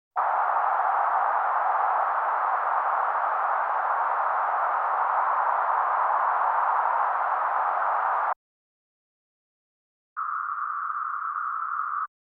800hz, 1khz, 1． 25khz -20／-16db L／R [l 1／3oct． Band Noise, R 1／1oct． Band Noise]; Denon Audio Technical CD